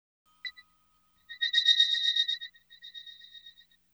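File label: synth, Hip Hop and R&B Drumloops; Best Service ProSamples vol.01; AKAI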